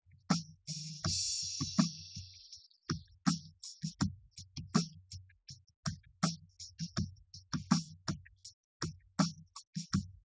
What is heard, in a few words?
wdrums; SiSEC10; underdetermined